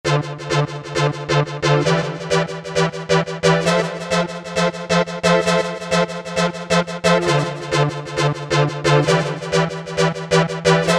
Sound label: Synbrasso, E-mu Proteus VX